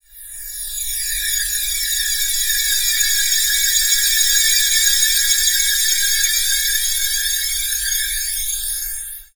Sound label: Flyer; Zero-G Seismic Frequencies; Flyers